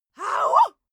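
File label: female attack auh, screams; schreie; menschen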